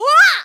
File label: Burn; Link getting burned